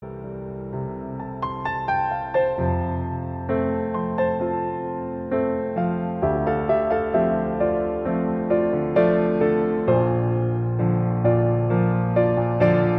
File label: piano cover